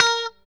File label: POP A#4, STRAT guitar; Spectrasonics; Hans Zimmer Guitars VOL-1; JC-STRAT POP